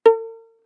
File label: Violin pizz ff sul D Bb4; MIS Pitches - 2014; Strings